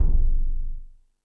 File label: More Toms, Drum Machines